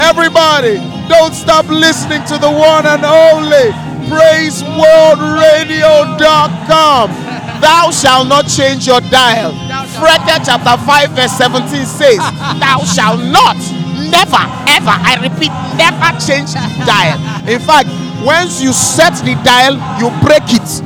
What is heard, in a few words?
Radio Jingle; Praiseworld